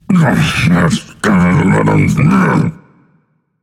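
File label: non-verbal grumble; smite; atlas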